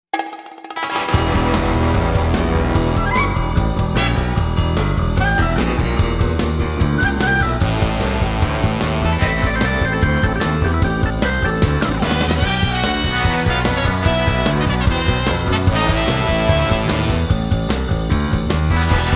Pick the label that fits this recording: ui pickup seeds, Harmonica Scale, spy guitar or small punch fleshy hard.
spy guitar